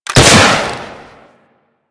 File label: Skiff Missile Fire, weapons; Grn Skiff Missile